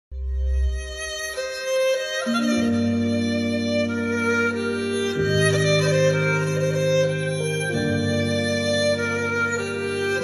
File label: Mobile Ringtone